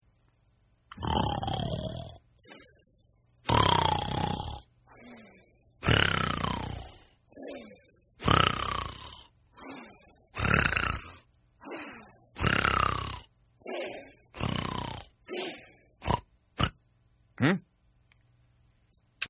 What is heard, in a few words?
Snore Cartoon; Snoring; Human; Snore Cartoon 1